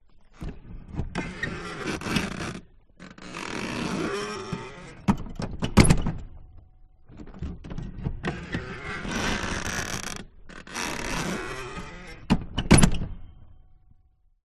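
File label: interior seat backrest; detunized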